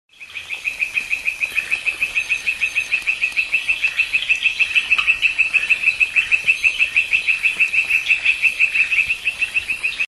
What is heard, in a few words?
Boophis elenae